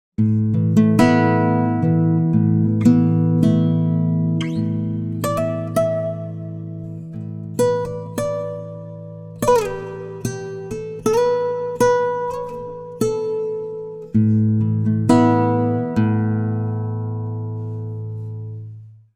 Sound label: guitar, time stretch; izotope radius